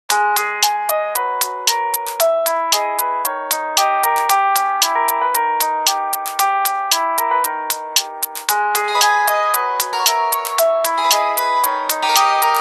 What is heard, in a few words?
Ring Leaves; HTC Touch Diamond